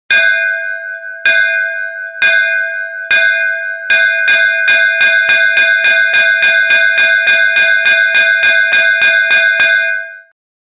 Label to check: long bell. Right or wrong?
Right